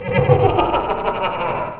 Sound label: laugh; xbomber